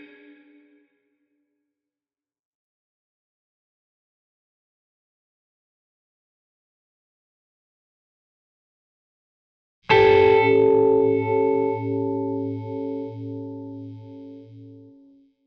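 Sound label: Blues Guitar Ending Alt; Digidesign; Pro Tools Essential Loops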